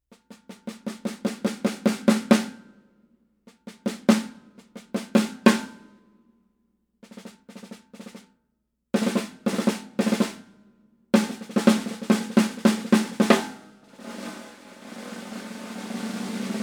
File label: Crav 4.5x14, Craviotto Maple